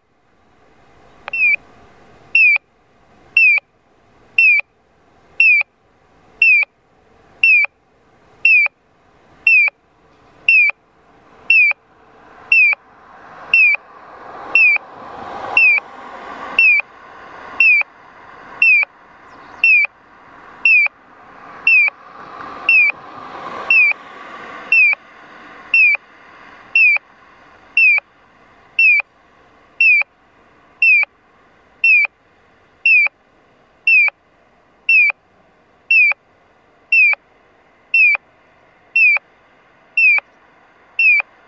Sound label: piyo; kyosan LTA-CBSI-B; onkyo kosaten; okayama; minami; akebonocho